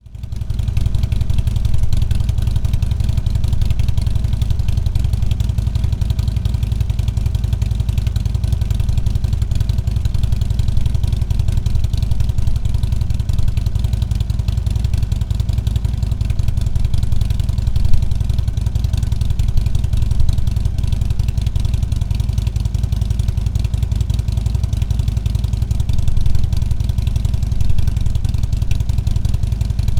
Touring 103 4 Slip On Idle

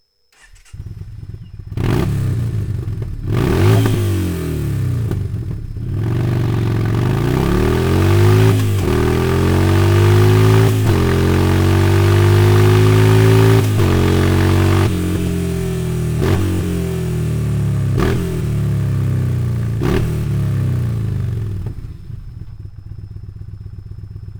slip on exhaust yamaha bolt scrambler; akrapovic

